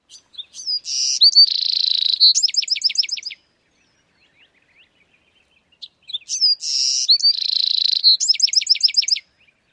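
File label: songs, lark sparrow; TX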